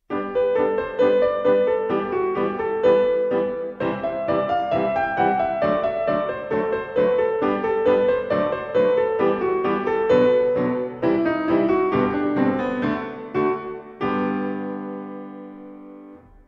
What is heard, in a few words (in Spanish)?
Improvisacion Pequena pieza para violin